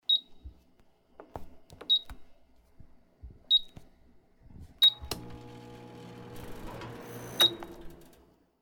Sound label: consumer electronics switch